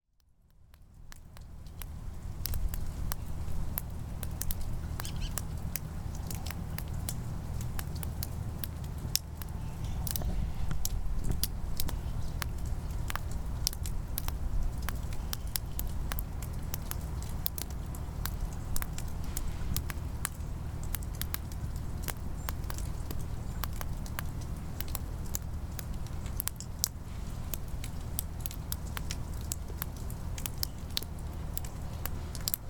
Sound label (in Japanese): mizuoto genkan mae no yukidoke; 水音（玄関前の雪解け）